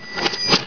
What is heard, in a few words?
CASHREG